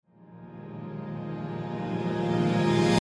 Crescendo Reverse Chord; Musical Sound Design; Blastwave

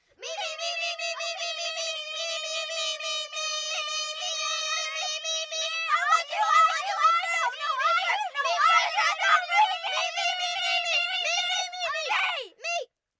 Group Pick ME, Group, 4-6 children, yelling; Screaming Sound Effects

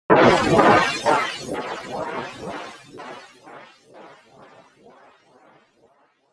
A Strange Electronic Sound; Miscellaneous Electronic Sound Effects

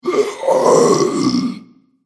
Zombie Indigestion; Zomby Sound Effects; horror

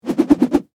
Whip Whoosh Multi, Throw; Foley; Heavy

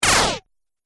Science Fiction Laser Plasma Bolt, laser gun

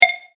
multimedia rollover, Multimedia Rollover Tone

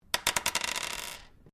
Dice Small Pair Glass Table Throw; Variety of dice sounds; Casinos

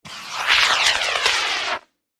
Rocket Launch Small, Scifi, Missile; Science, Fiction; Spacecraft; BlastWave

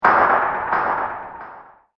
Tin Impact With Echo; Miscellaneous Impact Sound Effects

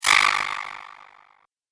Gritty Bitty Spell, magic sound effect; Andrew Fleming; Twistedtracks

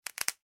packaging bubble wrap pops; foley; Bubble Wrap Packaging Being Popped; Plastic; Materials

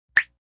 Blip; Beeps; multimedia

